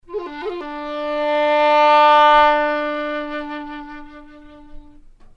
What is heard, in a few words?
tone